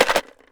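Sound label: ELM RATTLE, PERC; AKAI S6000 CD-ROM - WorldSounds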